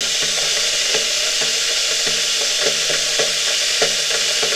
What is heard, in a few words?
BOILING WA; SFX